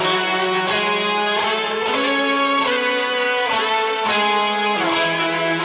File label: mega win loop; netent